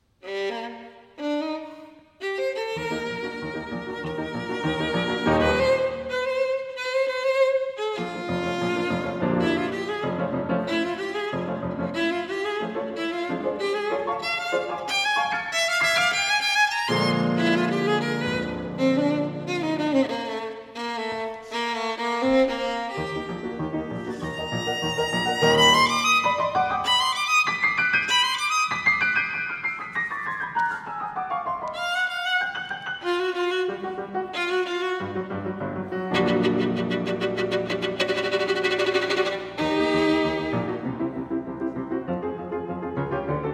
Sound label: Jazz Noir